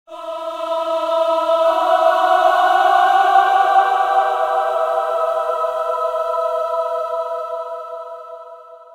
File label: Choir; Forest